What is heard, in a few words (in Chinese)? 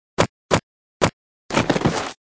摔倒